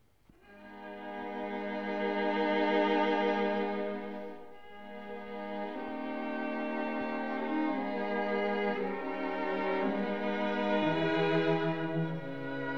String quartet in C sharp minor, Adagio quasi un poco andante